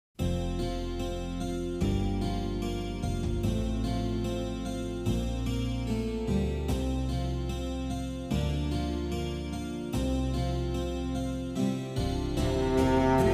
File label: Cello; AmbienceCello